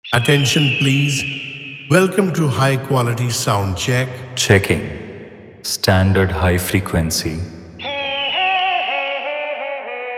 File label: Hindi Dj Remix Songs Hard EDm Bass Remix